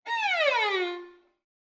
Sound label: Violin Slide Down